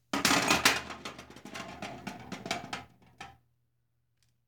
Metal Cayendo

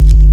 golpe duro

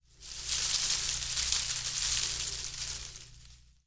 Fuego

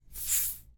Aire

